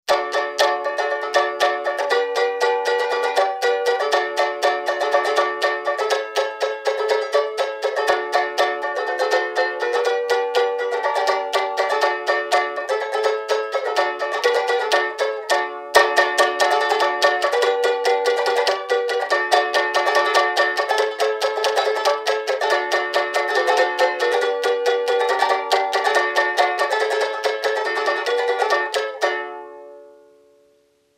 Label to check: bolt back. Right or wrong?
Wrong